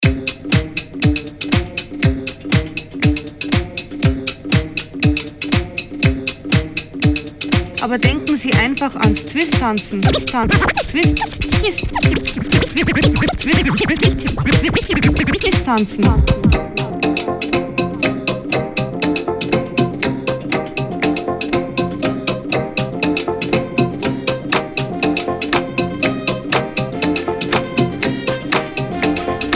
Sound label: vocal version